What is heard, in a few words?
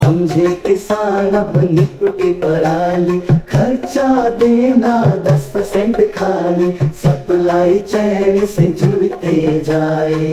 hindi, jingles